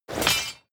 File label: drop metal blade small